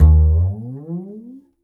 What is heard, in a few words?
Upright Acoustic Bass; 1ST POSITION; Larry Seyer